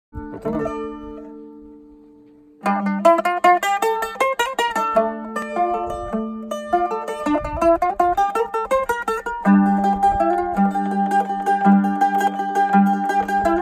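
krasivaya dagestanskaya melodiya, beautiful Dagestan melody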